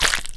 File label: hatch, frogspawn; block; minecraft